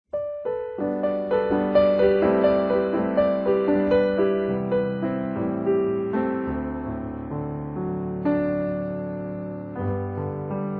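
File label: Music Ambient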